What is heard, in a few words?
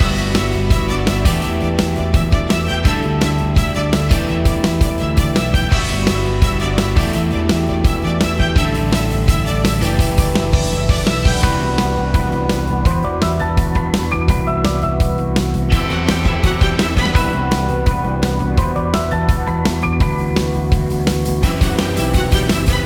Victory Music; Victory Music V2 Loop; Cthulhu Saves Christmas